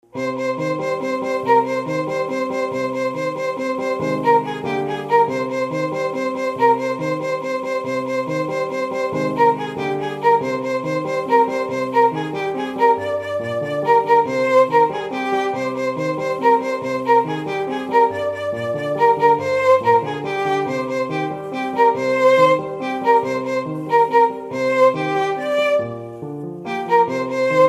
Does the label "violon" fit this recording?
Yes